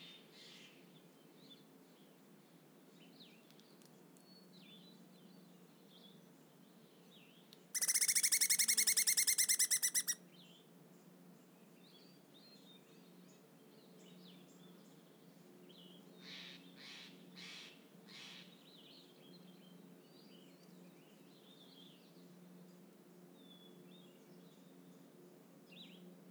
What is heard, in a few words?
Squirrel Lookout Hood Dawn Loud Squirrel; Oregon; Forest Meadow